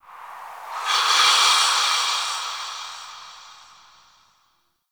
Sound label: Zariman Whisper Air Draft; Warframe; The New War; Ambience